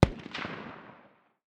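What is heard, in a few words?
light machine gun far distance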